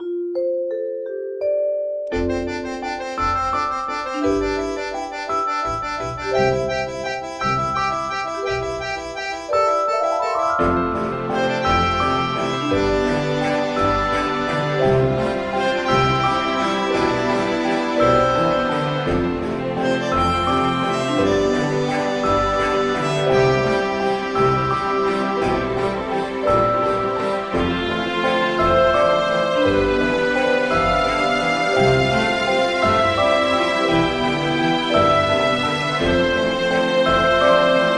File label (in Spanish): Danza final; Danzas de Marte Obra electrónica global inspirada en folklore imaginario